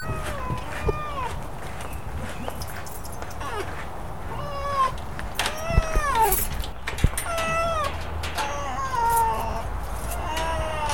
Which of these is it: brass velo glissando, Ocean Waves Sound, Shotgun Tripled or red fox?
red fox